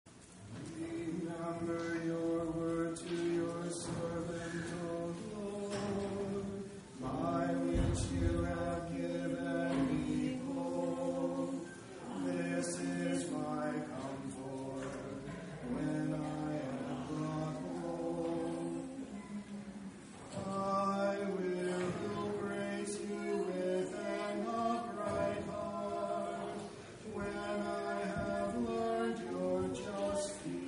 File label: Communion Antiphon